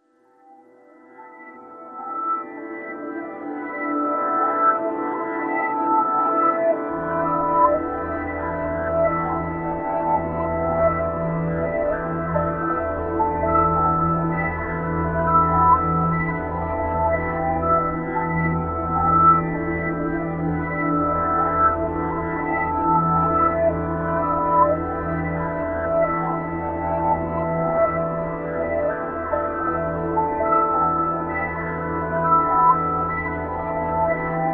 Bright Sound Carpet

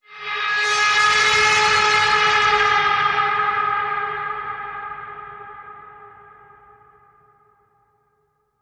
Bend, G sharp

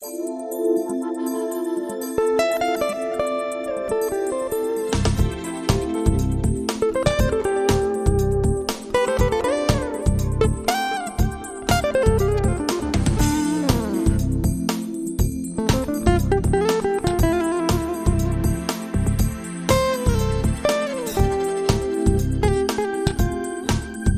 Funky Groove

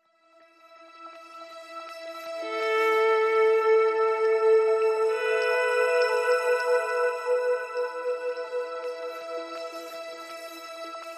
Ambient Mix

